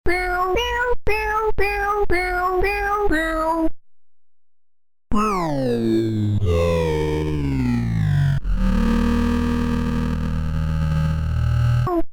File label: morphotron cat pitch